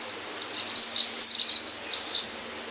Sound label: Birds Various; outdoor